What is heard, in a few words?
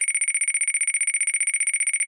science fiction alarm computer glassy beeps fast high pitched